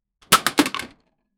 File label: paper box destruction; materials